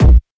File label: 00s short kickdrum sample a sharp key